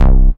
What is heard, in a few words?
Bass C, Offbeat Bass